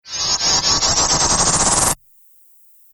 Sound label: Whoosh Speed Up Stutter; Swish, Zoom, Swipe, Whoosh, Swoop